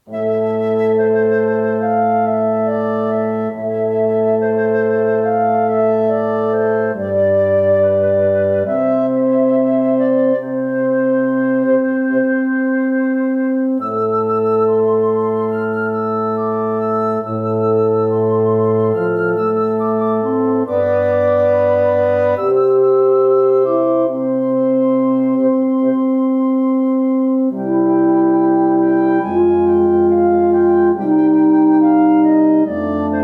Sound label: Wind minus Oboe